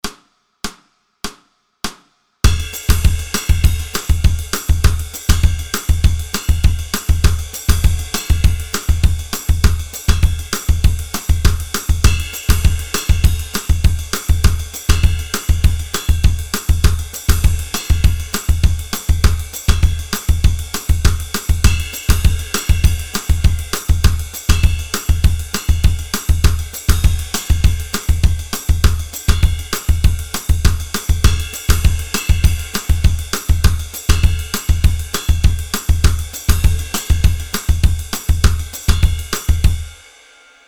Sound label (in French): Drums butee groove tempo 100; Grooves butées